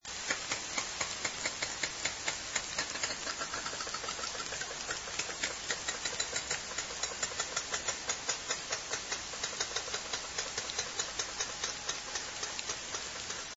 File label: click train, orca